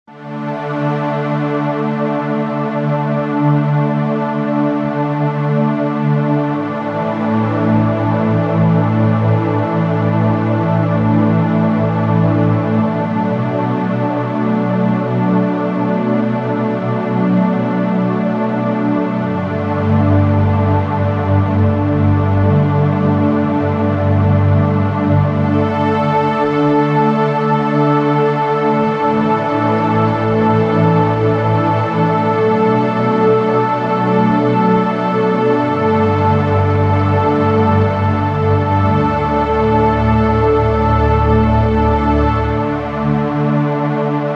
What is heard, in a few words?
Underscore PAD; Worship Flow